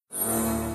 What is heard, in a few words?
match 4; john carter; lost symbol